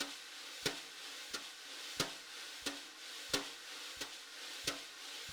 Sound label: Drums, Jazz